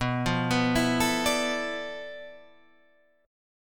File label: D Minor 6th Add 9th B